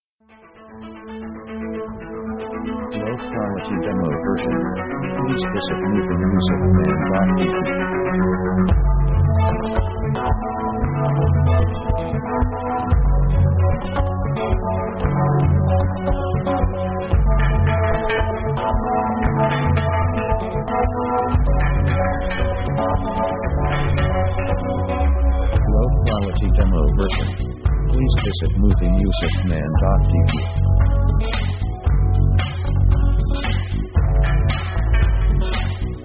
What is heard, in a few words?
time to dance